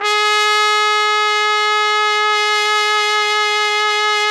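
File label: trumpet; Roland - LA Composer vol. 3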